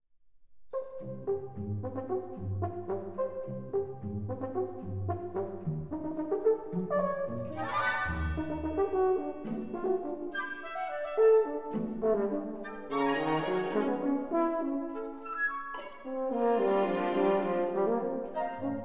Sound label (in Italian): allegro scherzando